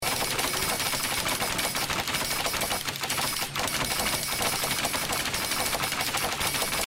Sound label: crits; risk of rain 2